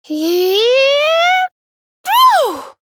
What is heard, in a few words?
ting; dqxi; serena